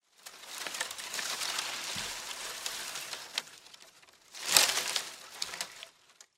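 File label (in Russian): бросают ветки на кучу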